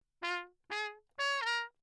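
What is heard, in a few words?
trumpet mute, phrase; Lechner; Engemann; Perinétventiltrompete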